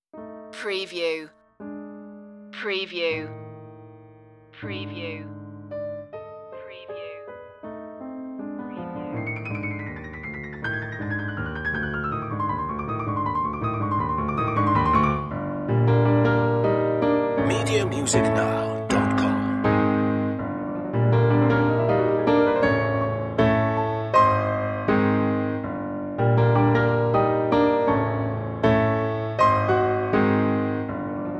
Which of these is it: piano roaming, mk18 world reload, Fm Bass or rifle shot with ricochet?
piano roaming